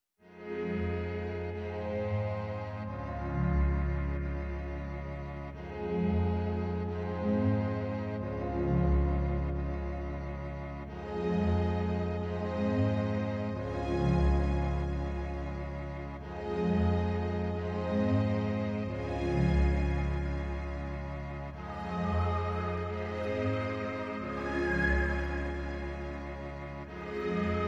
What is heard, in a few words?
No Drums No Bass Mix